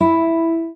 Classic Guitar

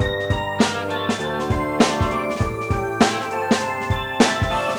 Pop tone, ringtones; Google; NewWave Labs

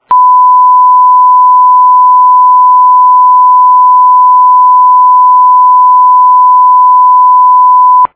1kHz 0dB; Samsung; SCH-U490 Trance

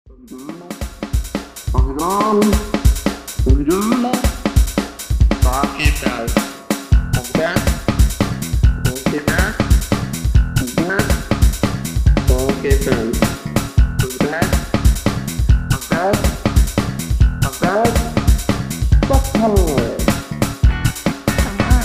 shaking, Sagem Melody; MY-V-75-CD

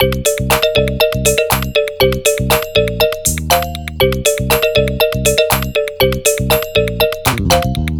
disco, alarms

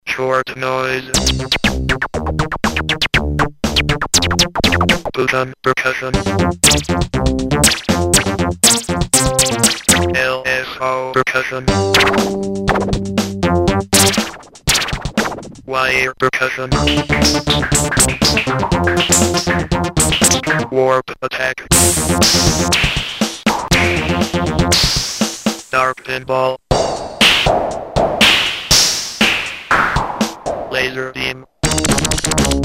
Noise

